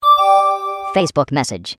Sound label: facebook msg, sms